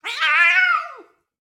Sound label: Screeches x 5; JASPER; Wearables